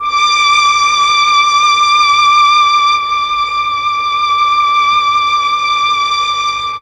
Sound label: MEL STR, Legendary M400; ILIO; Propeller Island